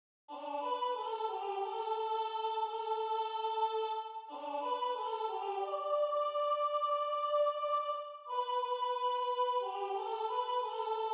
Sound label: soprano